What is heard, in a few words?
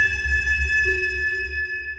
sonar Tail Water Far Shuttle Directional; Barotrauma; Real Sonar